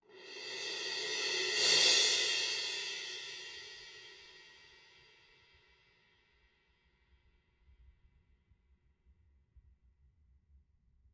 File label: Cymbal; Island Boss; trifox